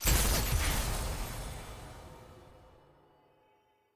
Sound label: ui council blue